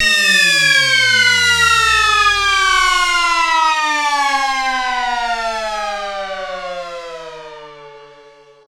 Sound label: FX Downlifter